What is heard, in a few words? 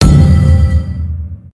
bonus chance; Aztec Secret